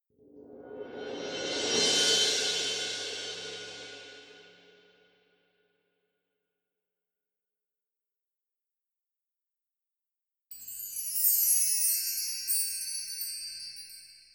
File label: Percu Chimes